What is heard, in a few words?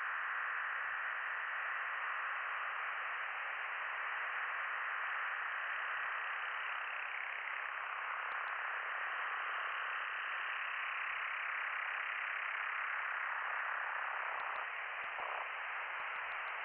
from radio, 7177KHz